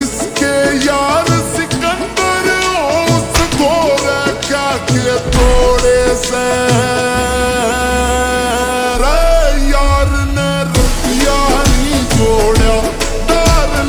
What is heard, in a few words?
Ringtone slowed and reverb